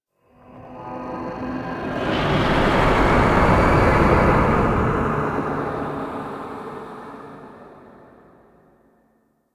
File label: Spectral Whoosh; Ambience